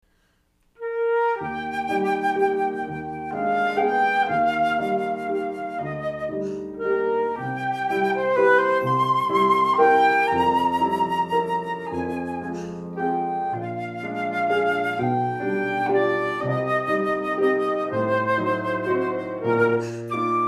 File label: piano nocturne